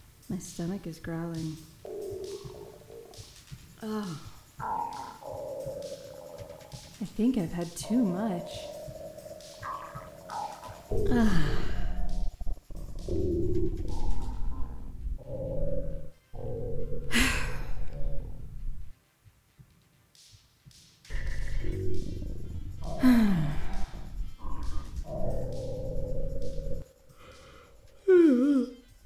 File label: Full Belly Growl